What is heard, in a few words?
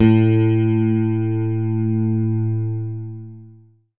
single A, fender; SGI O2 Out of Box Experience 2.2